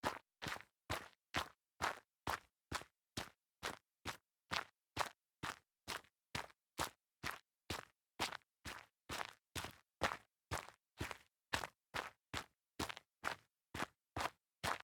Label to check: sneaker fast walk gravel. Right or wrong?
Right